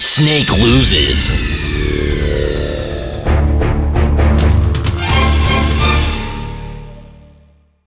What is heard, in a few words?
lose; mgs